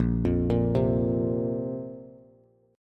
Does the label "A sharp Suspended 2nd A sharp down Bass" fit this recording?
Yes